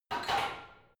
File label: barbell heavy plates replace rack reverby soft; sport freizeit; fitnessstudio